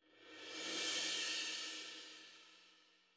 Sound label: cymbal swell; combat results win; Netease